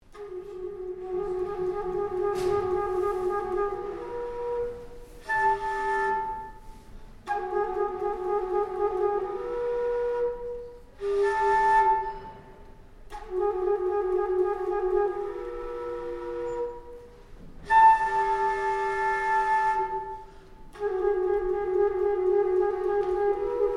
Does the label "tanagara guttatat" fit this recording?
No